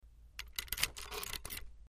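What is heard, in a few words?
Club Lock Unlocking, wheel lock; The Club Lock; Unlocking A Steering Wheel Lock.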